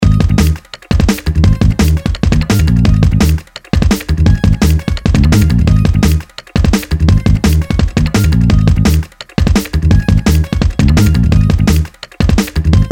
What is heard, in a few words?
Minigame Tree Bomb Fast, Music